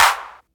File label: Clap n Snap, Snares